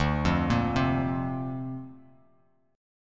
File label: D-Minor 7th Sharp 5th D Bass Standard, chord; Dm7#5 arpeggiated; JGuitar